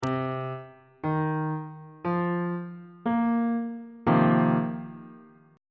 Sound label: f dominant 7th suspended 4th 2nd inversion on bass clef